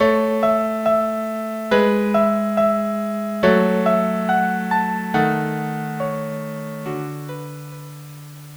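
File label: violin cello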